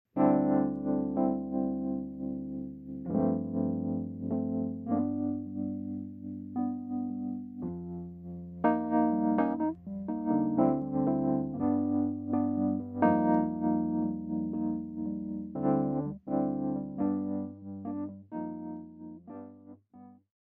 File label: wurly SV480 phasor